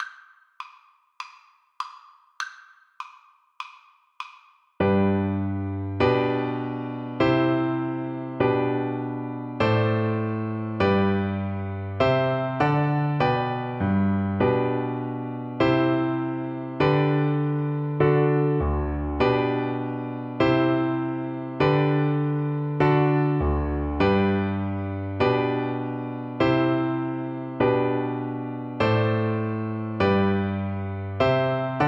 twinkle twinkle, Recorder

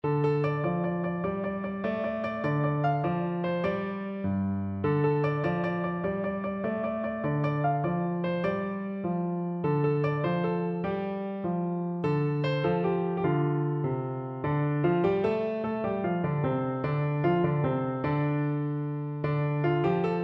PNO

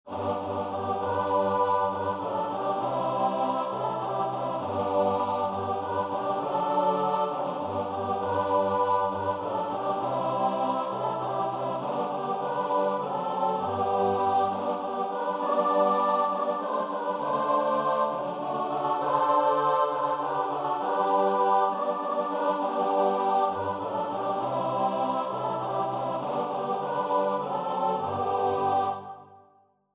choir; higher ground

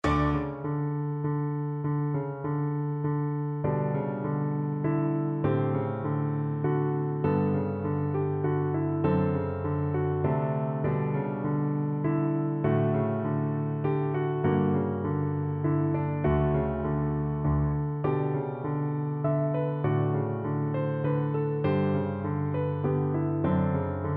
Pearl Fishers Prelude, piano

